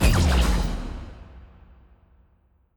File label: Laser; Minora-Game; Sound-Artlist